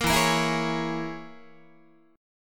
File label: E 6th Flat 5th E down Guitar